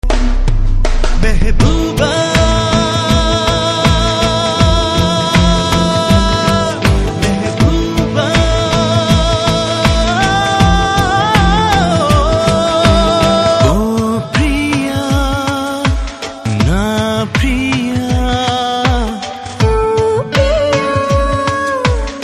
Ringtone

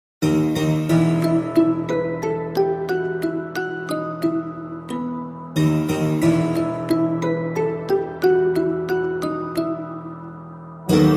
BGM Love Ringtone; Nani

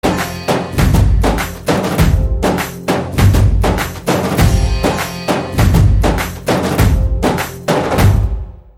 Love Bgm

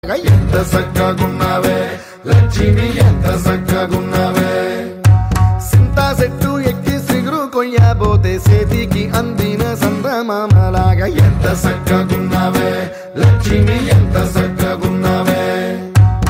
Ringtone

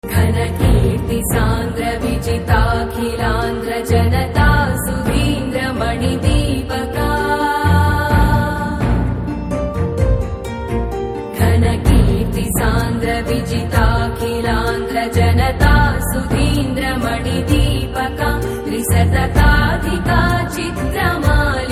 Female Version

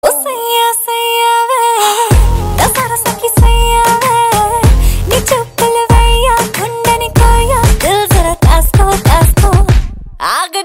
Female Ringtone

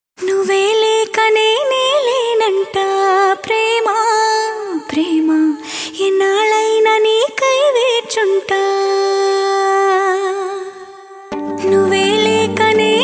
Female Ringtone; Seemaraja

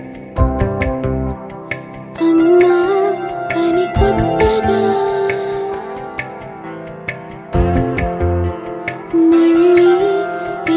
Bit Ringtone

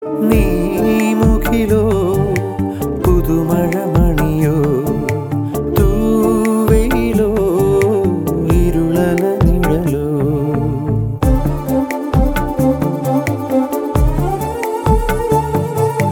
Male Ringtone

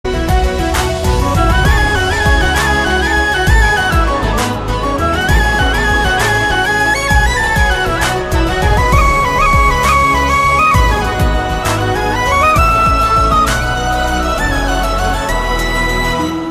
Love Bgm

